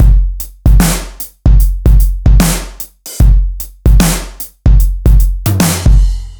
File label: Driven Kit; Reggae (Регги)